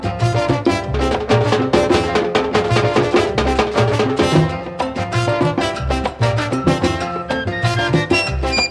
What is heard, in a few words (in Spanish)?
ritmo caliente